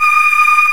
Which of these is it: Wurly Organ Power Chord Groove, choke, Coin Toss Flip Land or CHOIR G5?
CHOIR G5